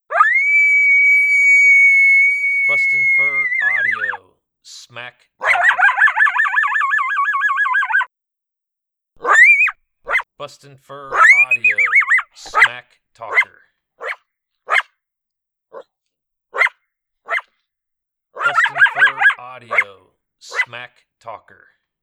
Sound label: howling with aggression.; BFA Smacktalker; MotoMoto doing his thing, howling with aggression. Excellent howl to use in response to resp